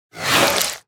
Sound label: Spider Spitter Scream Ending Combined; Deep Rock Galactic Boardgame